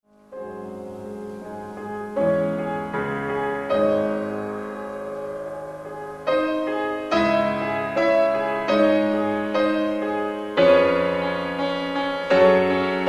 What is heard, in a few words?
Prelude No. 15 in Db Major Sostenuto